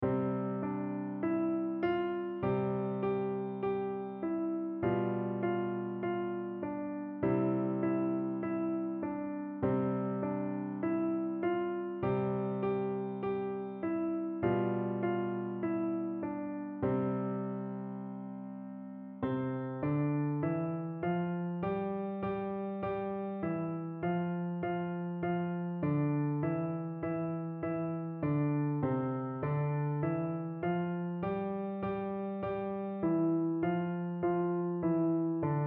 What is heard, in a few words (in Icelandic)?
polki; piano leikur 1 hefti